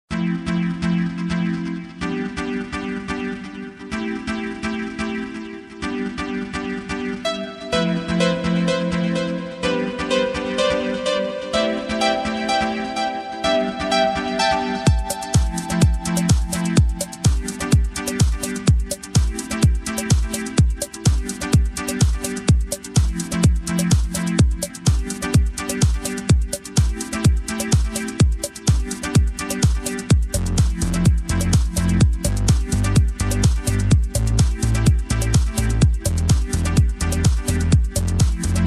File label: Funky Beats